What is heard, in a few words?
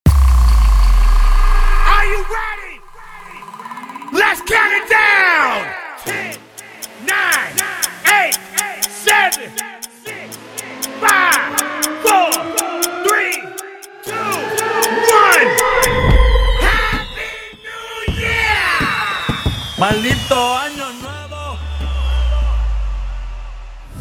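open afro bootleg 130 bpm; conteo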